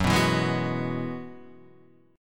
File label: F sharp 7th Suspended 2nd Suspended 4th F sharp down Guitar Open D